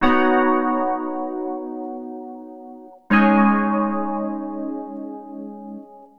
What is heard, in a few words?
E. Piano